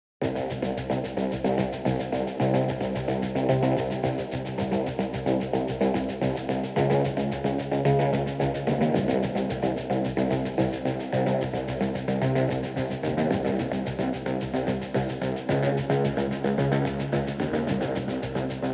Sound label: Pulsing